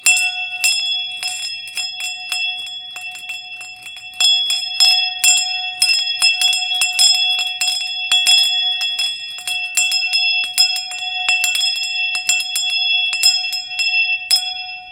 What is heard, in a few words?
wood handle bell